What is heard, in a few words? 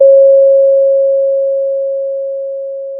pop, Sound of a doorbell